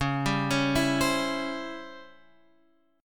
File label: C sharp Minor Major 7th Flat 5th, chord; C#mM7b5 arpeggiated; x,x,x,6,7,8,6,9; JGuitar